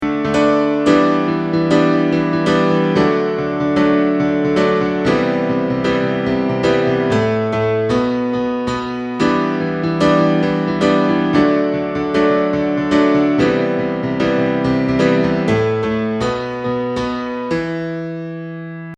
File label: piano filtered, A tighter piano sound with EQ; reverb